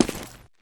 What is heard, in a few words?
concrete, footsteps; player